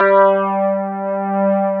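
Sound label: Wurlitzer G3, Keyboards Old School; USB Soundscan vol.09; AKAI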